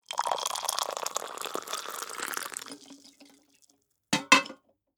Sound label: Serve Liquid Hot Beverages Pour and Place on Surface; Martin Scaglia